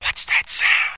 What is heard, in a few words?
whats that sound, male singing